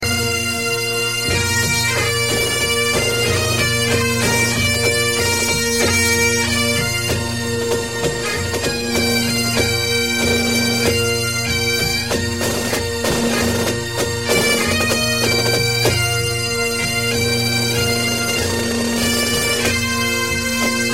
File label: bag pipes; ringtones